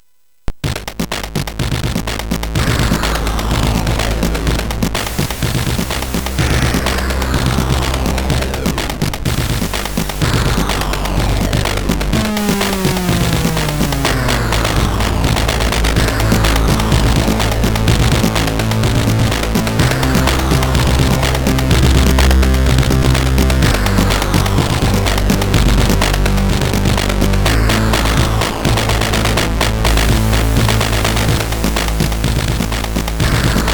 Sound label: Drum Fantastic, MOS6581R4